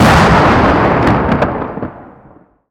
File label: EXPLOSION Distorted Long stereo